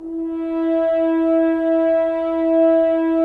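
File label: Solo French Horn, Brass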